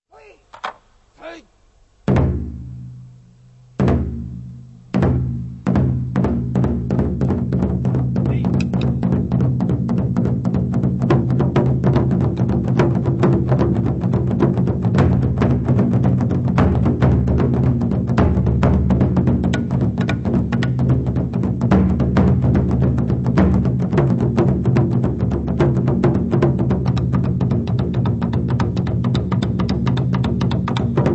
Various Japanese Taiko

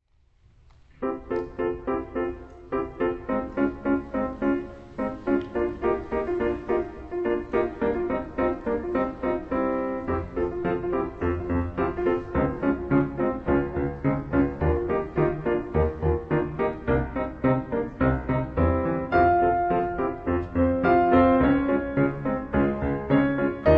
playing the piano

